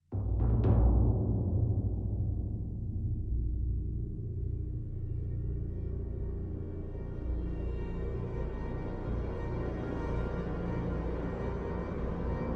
Chamber of Loss Ambience; Baldur's Gate 3; Act III

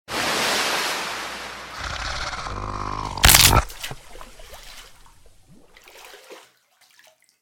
Monster Attack End

